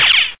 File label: kick